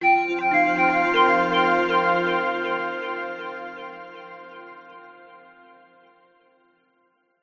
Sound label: multimedia logo musical short warm delayed soft corporate; zapsplat